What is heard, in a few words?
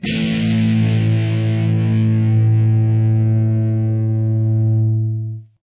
power chord